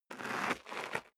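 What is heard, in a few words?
step sneaker concrete grit scuff, footsteps; schritte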